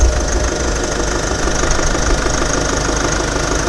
opel astra, vehicles

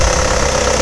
opel astra, vehicles

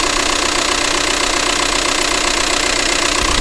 skoda, Vehicles

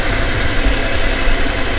matiz, Vehicles

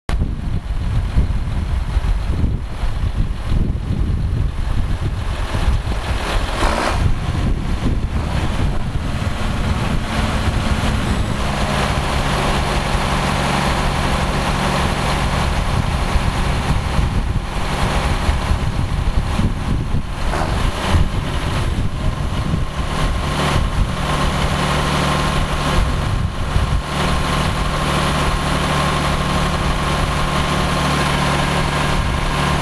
Tractor John Deer, heavy cars

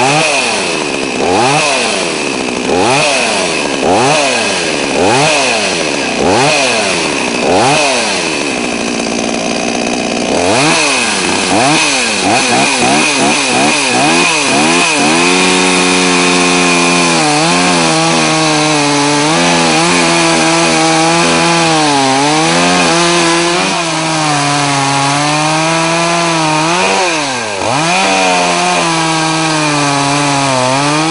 chainsaws, Ruris 340

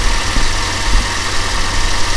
fiat, Vehicles

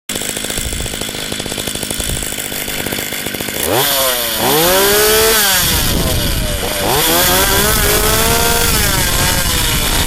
chainsaws, STIHL 230c

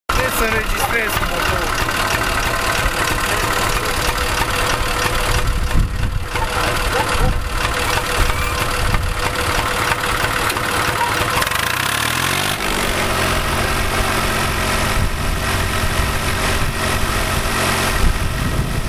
Tractor U650, heavy cars

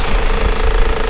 ford focus, Vehicles

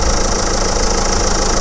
renault megane, Vehicles

